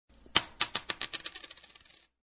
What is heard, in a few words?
Coin Drop Notification Sms Cute